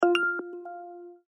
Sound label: accept dispatch